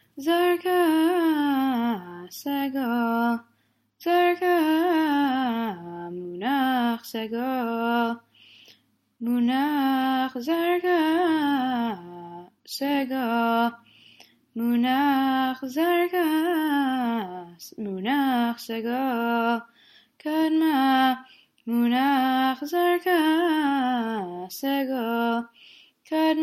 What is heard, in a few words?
polish lithuanian esther סגול